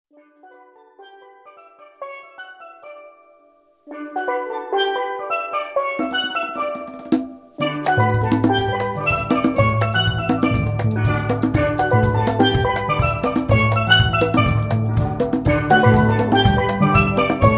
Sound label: salsa